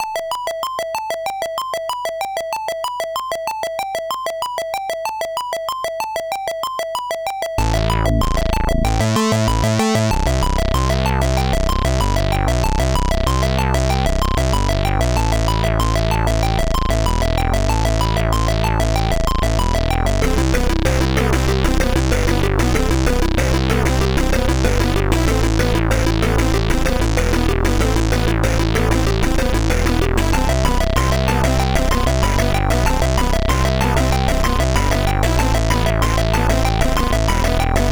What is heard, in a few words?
Unused Ingame, CSG8580R5